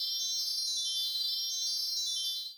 zelda grass; Los Acantilados Rojos; smbx38a; The Great SMBX 38A Contest II

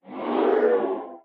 consume; reaperblock

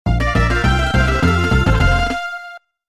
Stage Clear; Frenzied Forest; Donkey Kong Country; smbx38a; The Great SMBX 38A Contest I

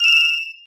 dragon coin; Los Acantilados Rojos; smbx38a; The Great SMBX 38A Contest II

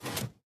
piston retract; smbx2; Redstone Test World

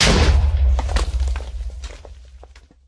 tnt explosion

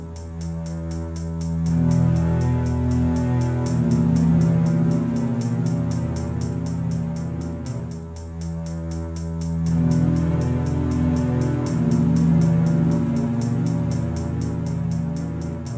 Ominous; StormatuaryCastle; smbx38a; The Great SMBX 38A Contest II

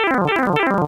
player shrink; Los Acantilados Rojos; smbx38a; The Great SMBX 38A Contest II

